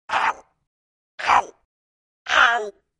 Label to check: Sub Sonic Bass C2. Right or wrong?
Wrong